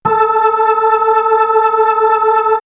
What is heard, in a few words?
Slow Leslie; X-Static Goldmine; backtothe70's